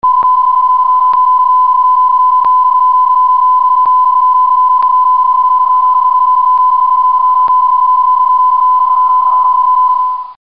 psk31

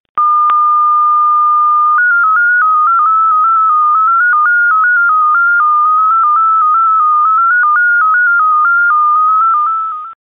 mfsk8

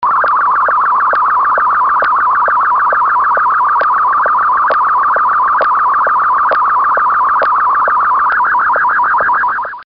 sstv, funk

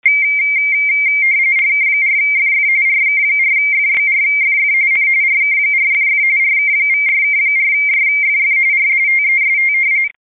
rtty

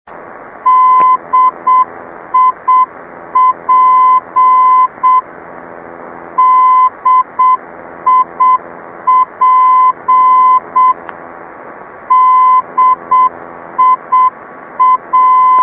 ndb dip, funk

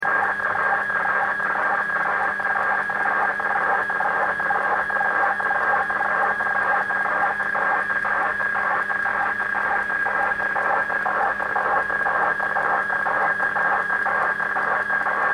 hf fax, funk

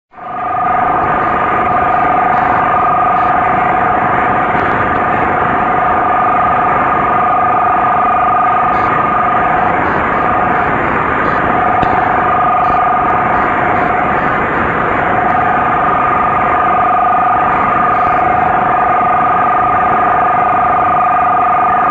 rtty

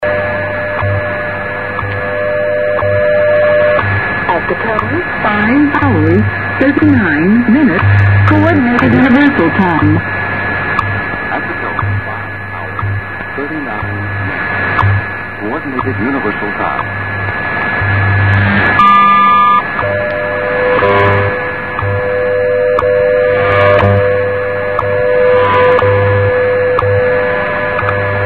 wwvh wwv 15000, funk

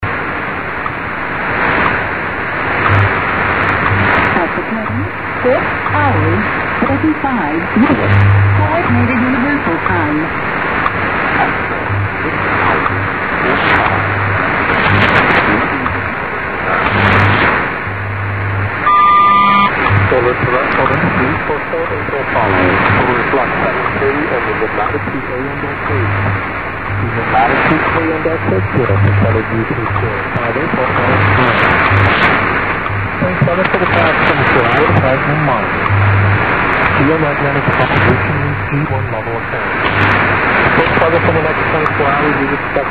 wwvh wwv; 10000

